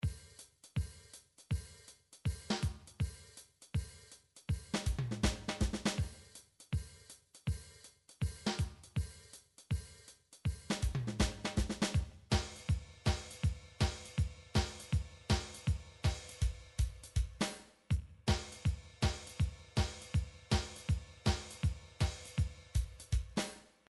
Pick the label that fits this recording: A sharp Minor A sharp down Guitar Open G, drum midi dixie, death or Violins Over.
drum midi dixie